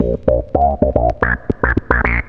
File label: fat bass loop